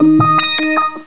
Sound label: Voco down; meetmediaband